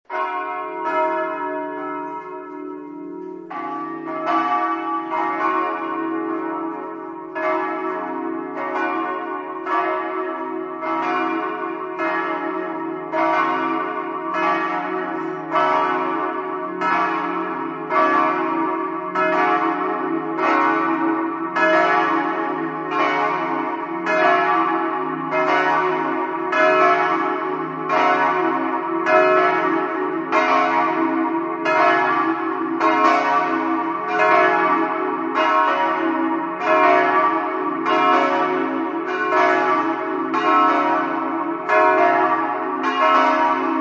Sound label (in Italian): campane